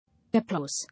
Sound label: Applause